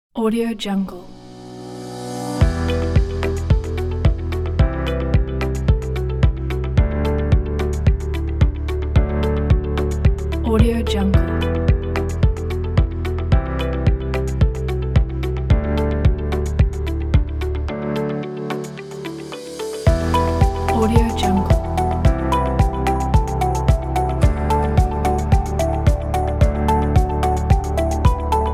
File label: digital technology ambient corporate